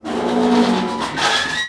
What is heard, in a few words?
Gate Squeak; DunDef